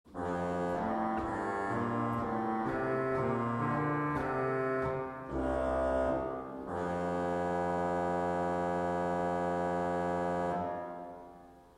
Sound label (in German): Posaunenbass 8